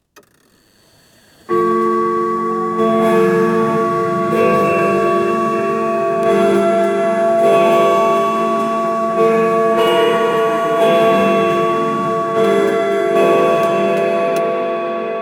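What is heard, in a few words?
a heavenly clock ticking; time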